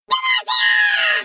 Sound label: sapling; demacia